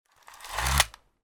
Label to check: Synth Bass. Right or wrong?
Wrong